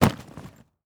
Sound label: Weather Snow Impact Duffle Bag Dropping On Snow; AdobeCC